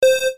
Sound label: start